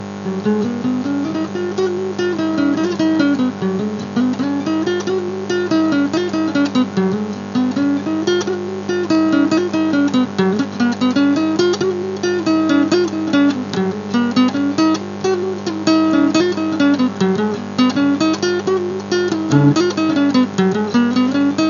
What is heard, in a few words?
baby guitar